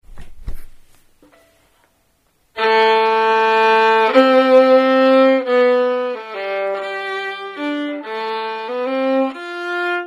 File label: Greensleeves harmony, violin only, duets for violin only